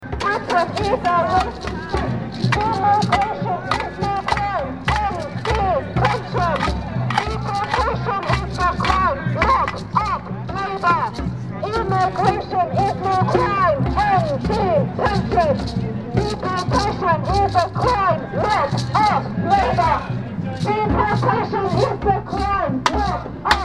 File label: more chants